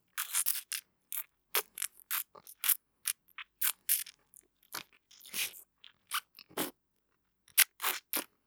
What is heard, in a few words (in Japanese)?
フェラ; うさみみの地下アイドル＋コンビニバイトBさん